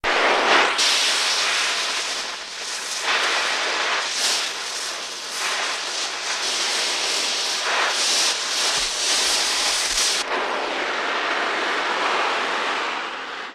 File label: Acetylene Torch, Long With Bursts